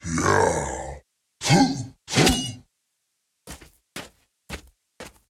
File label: Ogryn Attack; Puzzle Chronicles; PS3; PSP; X360